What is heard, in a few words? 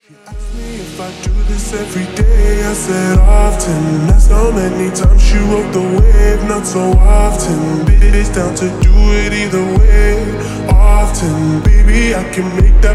slowed remix